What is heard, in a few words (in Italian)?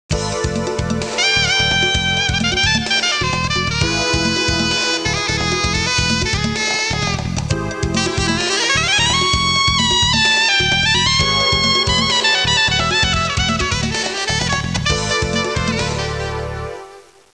musica urban